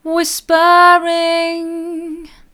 whispering mid

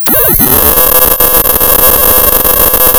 pop, tearing paper

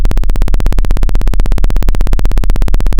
Sound of a plane taking off

